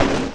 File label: Perc Hit, drums; Drums & Drones